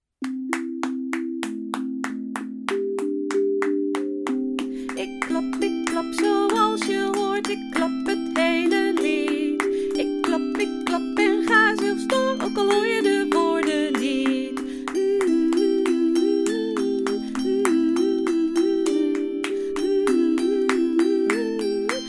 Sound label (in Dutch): klapliedje met zang